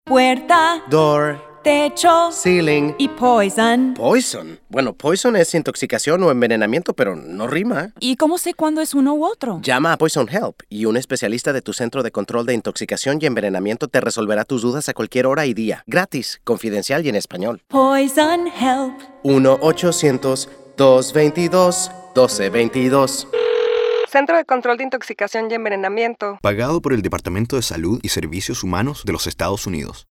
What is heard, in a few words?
Chicken Piano; Poison Pollito; Public Service Announcements Spanish Radio Spots 30s; Spanish Poison Help 2